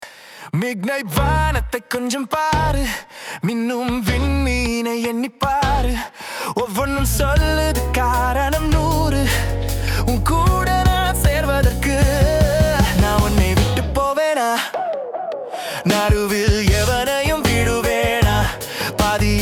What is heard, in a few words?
tamil